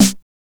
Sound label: soft snare